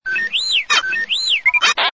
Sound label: R2D2 Alarm